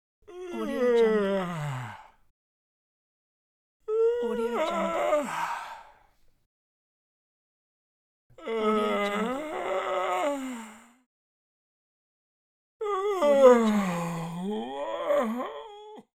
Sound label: yawning